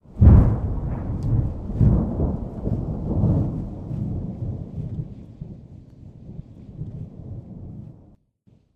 Thunder

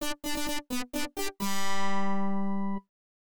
Fanfare; Daikoukai Jidai II; FM Towns

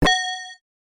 Gong; Butasan; arcade

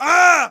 Male Pain

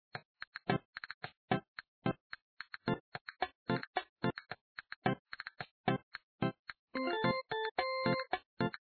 Jukebox Loop; papas hot doggeria; android; x68000

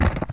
ice crunch; ice age scrats nutty adventure

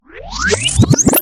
Tape Start

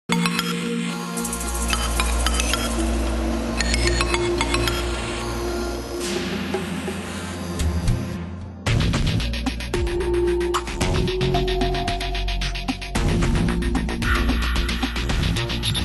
Dungeon; Terraria

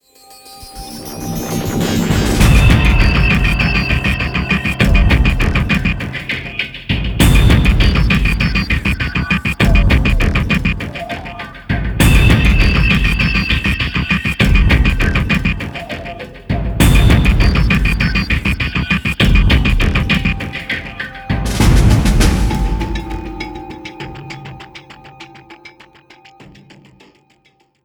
End Music; frontlines fuel of war gamerip 2008; OXM

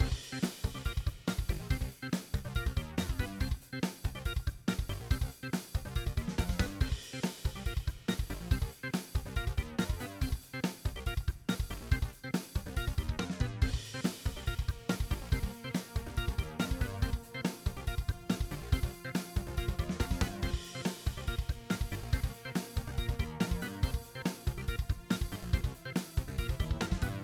Bgm Stage Menu; Nintendo Game Seminar 2013 Student Works; Wii U

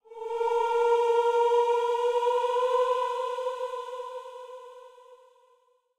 amb hymns eerie; gamerip; blackfaun

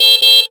Horn

